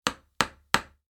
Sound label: hammering nail